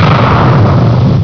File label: BOMB, 战争, 炸弹; 特酷声音素材库